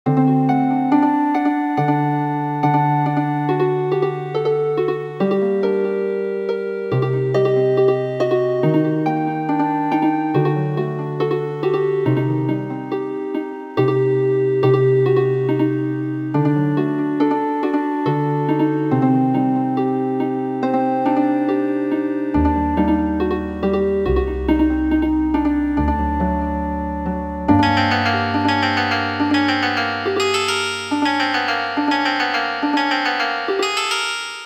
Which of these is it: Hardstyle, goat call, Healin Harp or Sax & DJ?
Healin Harp